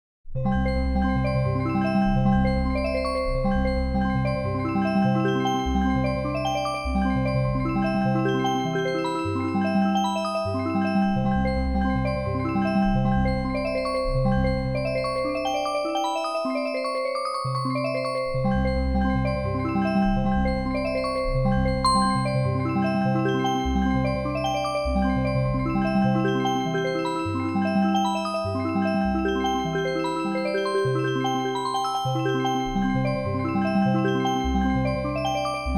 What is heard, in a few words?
music box; fractal music